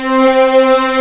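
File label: C4 legato, violins; East West; The Ultimate String Collection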